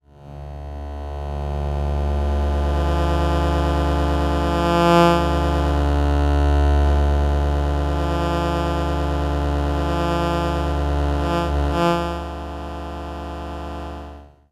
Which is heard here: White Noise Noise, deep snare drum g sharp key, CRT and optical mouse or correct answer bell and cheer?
CRT and optical mouse